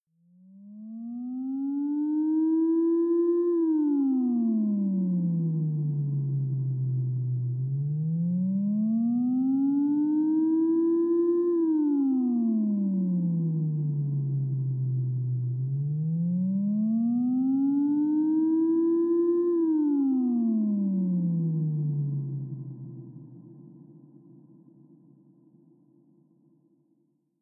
space police cop sirenes low

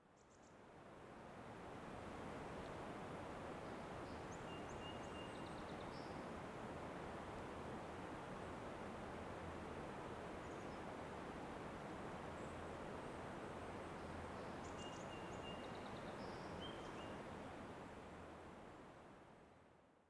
river atmo

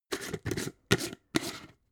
jar glass plastic close slow

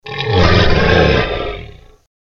danger growl

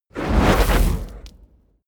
whoosh fire sizzle small

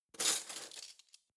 cutlery spoons drawer grab

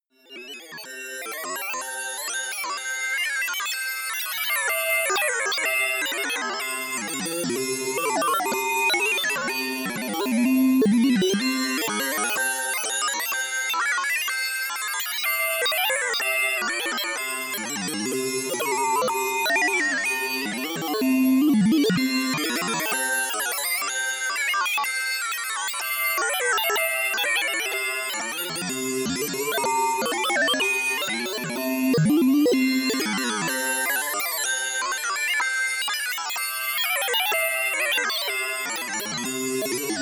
atmosphere computer goes crazy

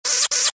ducky monster

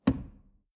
drawer medium close lock hard

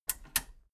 metal button radio click power off slow

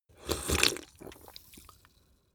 drink soda can small slurp small

